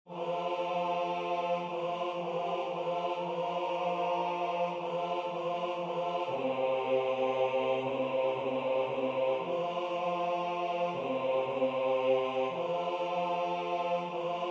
HokiHoki bass; Potpourri 2025

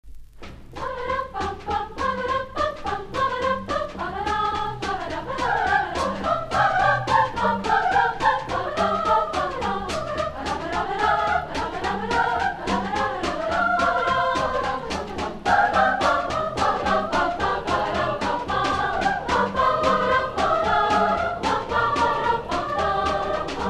Fugue in C Minor, Spring Choral Festival; J. S. Bach; arr. Ward Swingle; A Cappella Choir; Grosse Pointe High School; Spring Choral Festival 1966

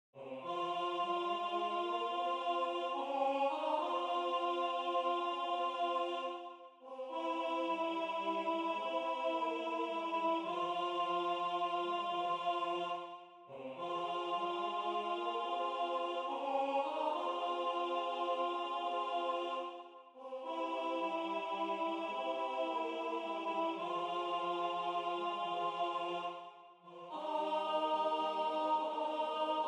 alto; Midyear Concert 2026; Waiheke Island Choral Society

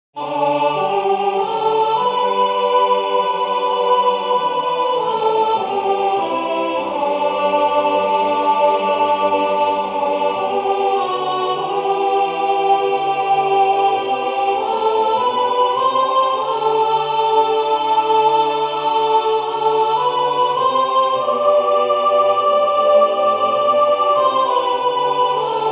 Waly Waly sopr

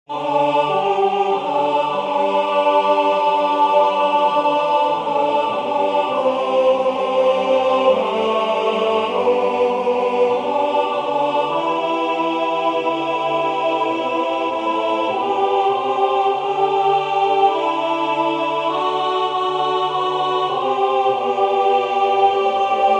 Waly Waly alto

